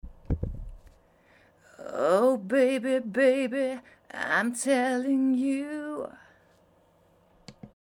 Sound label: Creak